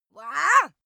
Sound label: female attack